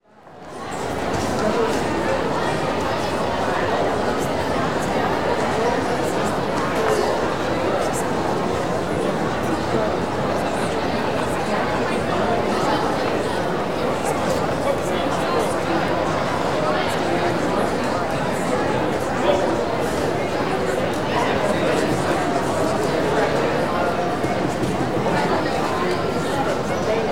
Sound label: Crowd Indoor Museum Exhibit, Walla; Sound Ideas; Walla Series